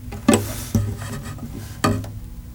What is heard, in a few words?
MISC GTR, Nylon Guitar